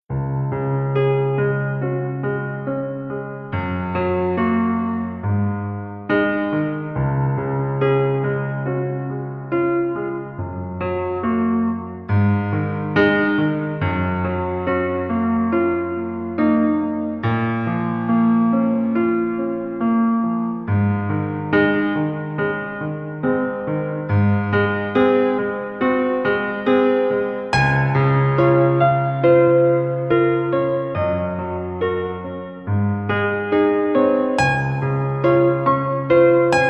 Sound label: 432 hz sentimental; music in action